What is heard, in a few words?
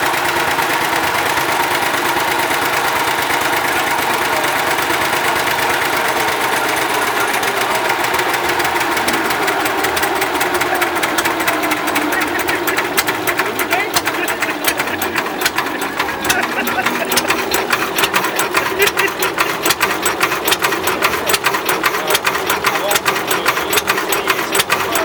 retro festival